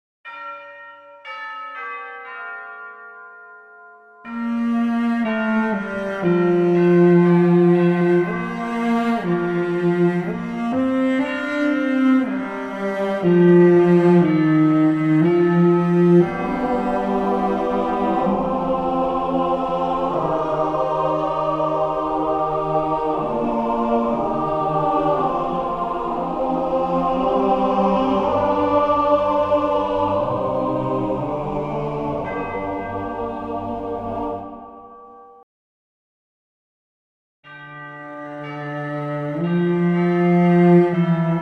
Sacred Monastery Choir with Cello & Bells, Orchestral Music; Demo Celestial